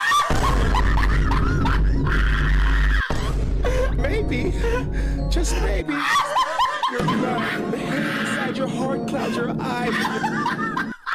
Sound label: funny laugh yeah